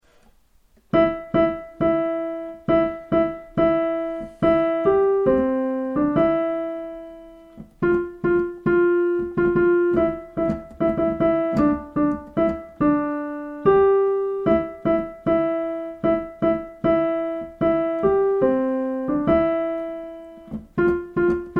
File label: Jingle Bells